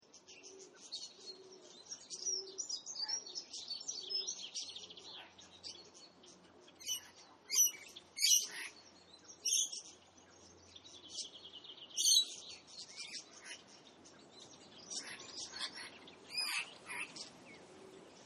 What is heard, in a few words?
Birds Loop; Nature Scenes; Animals